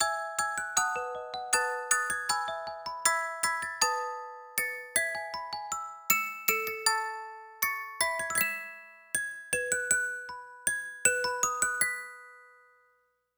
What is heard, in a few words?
cuckoo